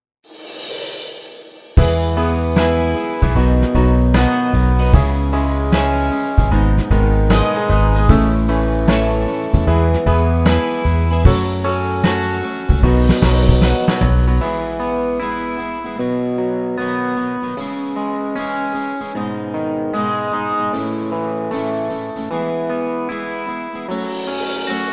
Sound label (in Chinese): 温暖流行摇摆 Pop Swing; cubase 编曲助手